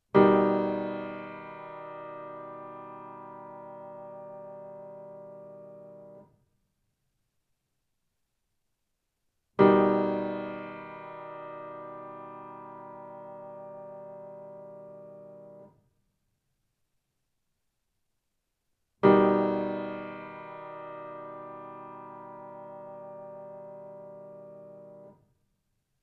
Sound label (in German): Dreinklangs Grundstellungen